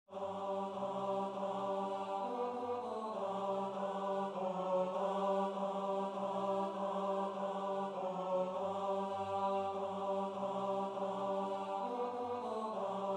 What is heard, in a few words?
tenor